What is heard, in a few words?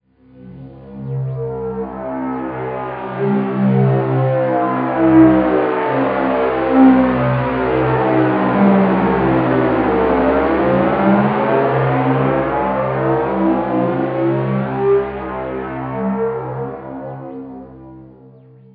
Distant Resonance, Analogue; Zero-G Ambiosis